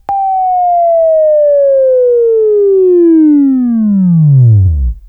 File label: Buzz, Sweeping Training